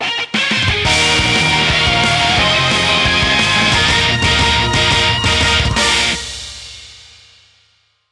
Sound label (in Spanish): Tema de victoria; Xenoblade; SSB4